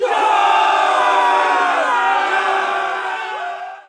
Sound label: victory; svencoop; sence; source of life